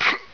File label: pain, player; hl2